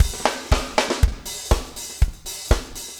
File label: 4 on floor 120, loops, 120bpm